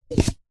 food drink coffee cup takeaway insert single cup into stack